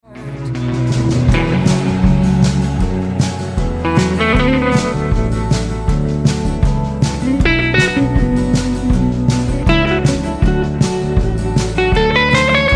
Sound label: Americana Guitar Solo